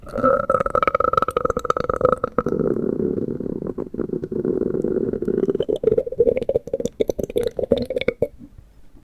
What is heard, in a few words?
continuous gurgling growl, human body